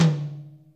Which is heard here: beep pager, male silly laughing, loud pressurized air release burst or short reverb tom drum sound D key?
short reverb tom drum sound D key